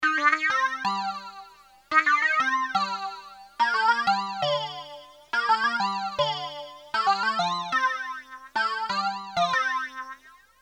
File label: electric mistress solo